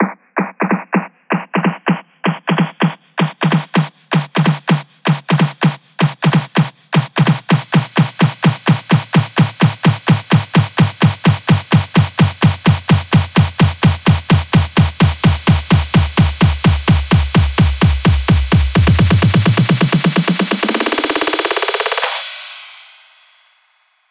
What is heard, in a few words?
128BPM Essence, House Electro Fills; 12Bars; Vengeance Samples